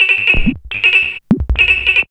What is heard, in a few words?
BIRDY 160, Hard & Loud Techno; USB Soundscan vol.01; AKAI